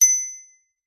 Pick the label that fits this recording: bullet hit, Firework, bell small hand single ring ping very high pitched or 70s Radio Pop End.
bell small hand single ring ping very high pitched